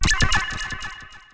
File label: colide thing; wob2 demo